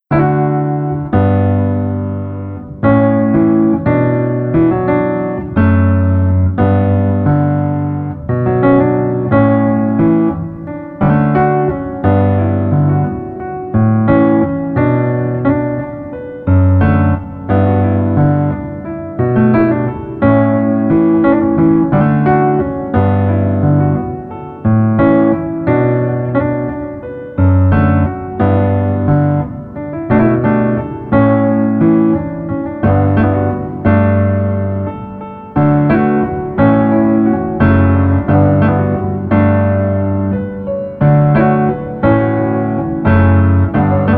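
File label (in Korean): Piano version 멜로디 MR -1키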